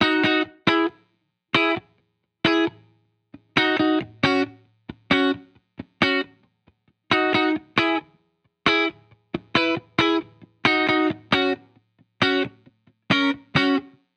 Guitar Bpm135 Key B, 70s Guitars; Fox Samples - Back to the 70s Guitars

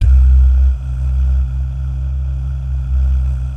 DAH, Vocal Planet, Jazz & FX, DAHS GUYS; ILIO

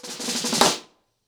Snare FX 90BPM, ACOUSTIC DRUMS; Sonokinetic - The Roots Drumloops

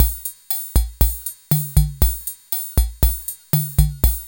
CHACHA; INIS - Funky Xtreams; KR55 LP2

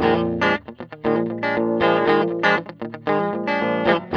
Tele 7th B, guitars; Spectrasonics; Hans Zimmer Guitars VOL-1; TELE7THS

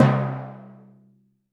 percussion, Roland TD-20

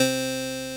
ORG VOX C, Continental; Roland LCDP09 Keys of the 60s and 70s 1

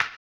HAMBONE, Vocal Blues Soul; Spectrasonics; Planet

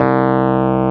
PNO XWURL C2, Electrics; Club-50 - Foundations Roland; Rds & Wurly

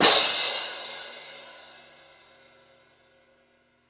Cymbal, Orchestral Percussion One Shots; Sonic Foundry (Sony Creative Software); Orchestral VOL-2

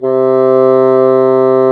BASSOO, Bassoon; Brass&Woods

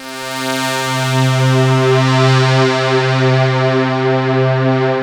TIDAL WAVE, KORG O1/W; Rubber Chicken Software Sample Bank

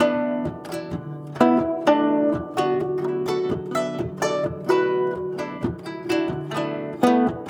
Guzheng Poly-Rhythm; Continental Drift Aftershocks

